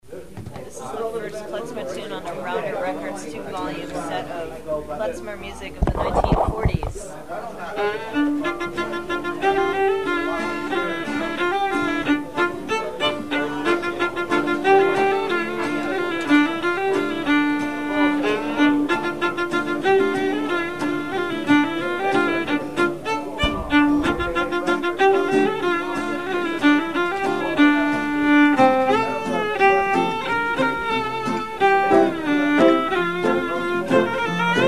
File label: klezmer